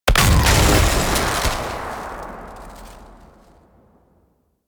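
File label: Plains Electric Machine Rock Blast; Grn Elec Mach; Warframe; Ambience; Eidolon Plains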